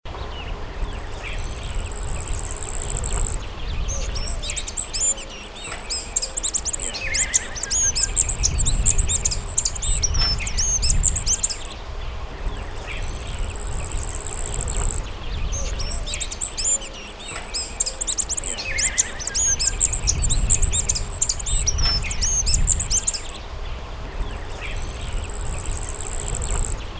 suara bee hummingbird

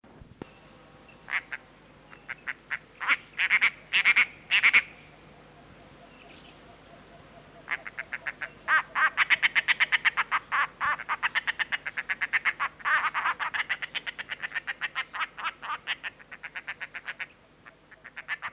tengkek buto glaucurus

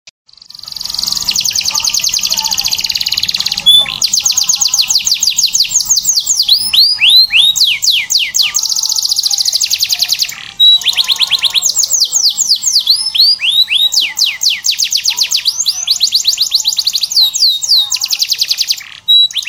kenari raja baja

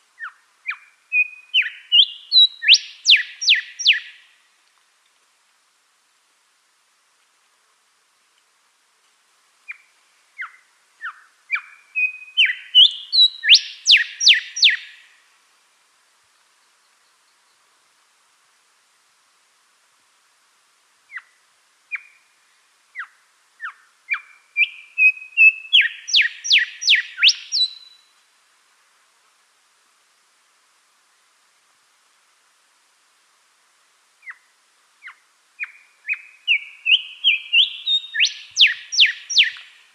kancilan lorenz

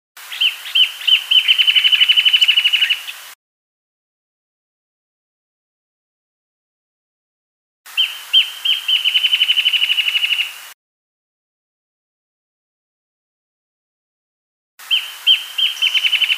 suara kicauan burung wrentit

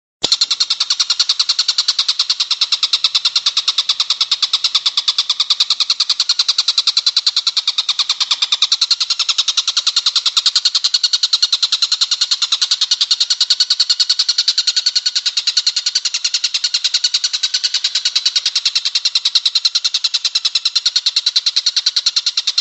master jalak suren

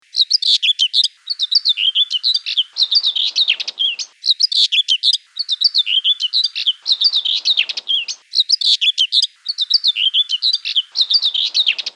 whitethroat kombinasi

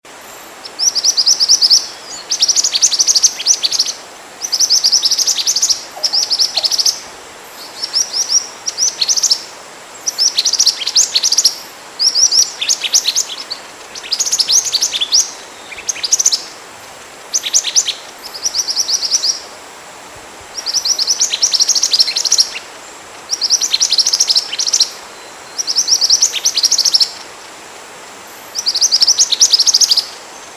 suara burung mantenan himalaya

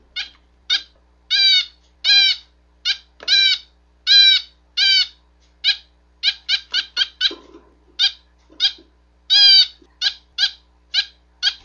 suara owl finch betina

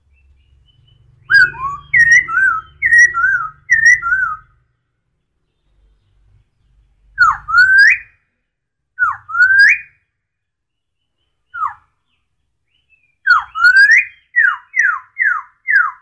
suara tarian poksay hongkong

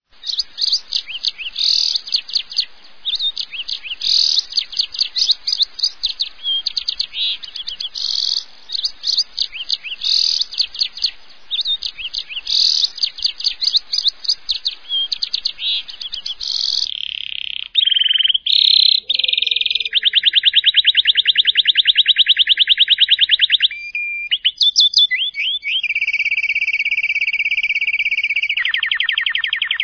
melatih lb ngekek panjang

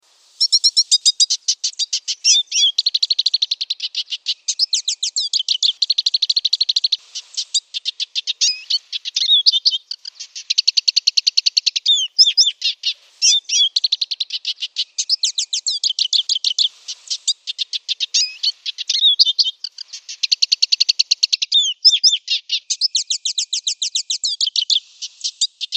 suara siskin hitam

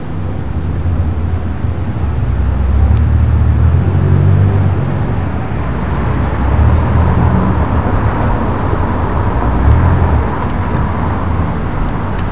suara mobil

